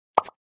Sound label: Pop up text notification